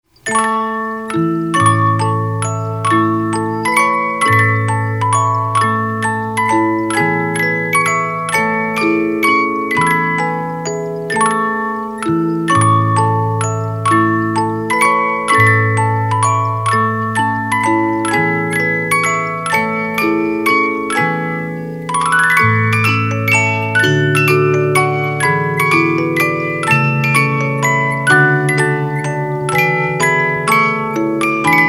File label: Music, music boxes, 50-Note Movements; Fireworks Music